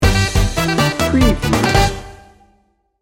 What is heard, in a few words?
salsa merengue madness sting bumper